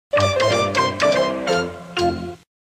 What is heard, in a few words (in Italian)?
Jingle canale 5 anni 80